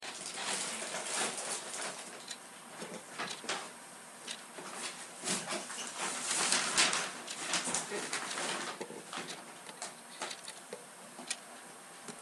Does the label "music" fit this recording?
No